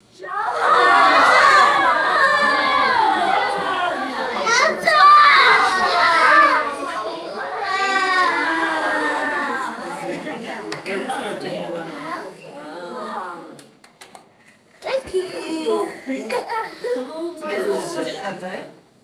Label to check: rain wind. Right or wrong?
Wrong